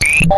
ui click then whistle ascend then beep x2 ascend stepped; Skyclad Sound